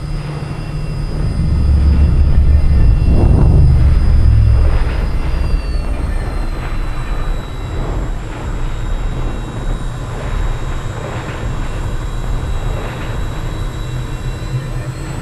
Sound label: straton hover; Titanfall 2